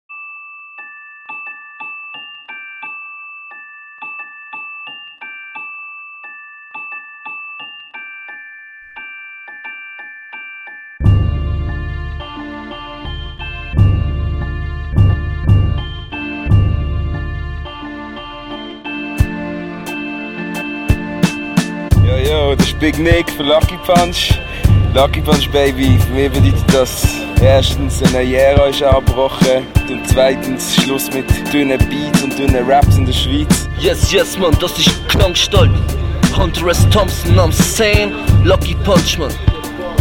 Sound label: outro vocal